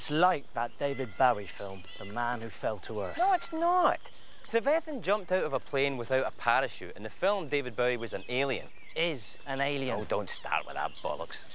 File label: alien